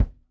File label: V20 Kick; Digital Sound Factory